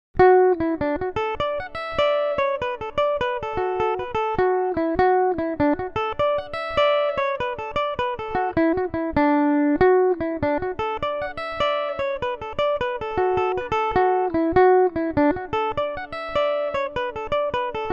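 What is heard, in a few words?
D major, Irish mandolin; Out On The Road; Belmuse EM-190 electric mandolin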